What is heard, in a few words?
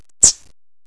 tttt